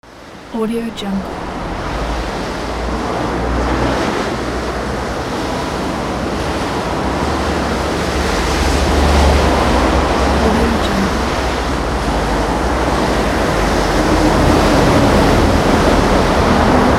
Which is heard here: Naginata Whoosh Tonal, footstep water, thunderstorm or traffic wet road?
traffic wet road